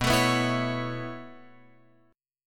B 7th Suspended 2nd Suspended 4th down Guitar Open F, chord; B7sus2sus4; strummed